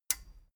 metal button stereo release disable

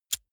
lighter wheel spin soft

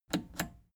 plastic button scratch smooth click disable slow

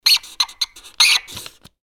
squeeking pen

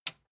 metal button tiny push enable

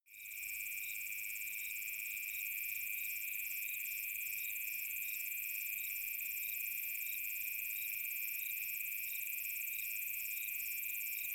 savanna summer afternoon cicadas

